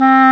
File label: WND CLAR, Clarinet Sh; Roland L-CDX-03 Disk 1